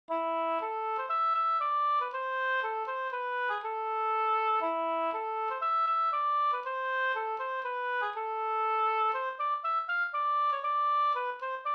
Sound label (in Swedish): folkdans; Valborgsmassodans